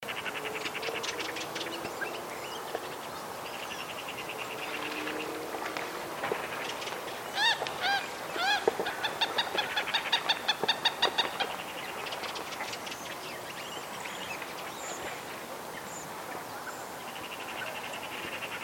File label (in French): grive mauvis; automne et hiver 2024